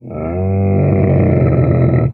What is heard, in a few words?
Camel Groan Single; camels